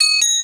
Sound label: letter